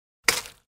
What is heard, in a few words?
fights